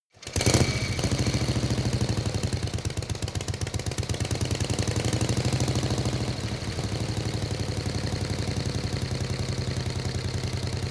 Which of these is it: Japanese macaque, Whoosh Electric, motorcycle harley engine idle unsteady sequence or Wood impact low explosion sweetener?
motorcycle harley engine idle unsteady sequence